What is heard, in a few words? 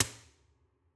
Textures Piano Strings Echo; Impulse Responses; Ableton Live 12 Lite; Hybrid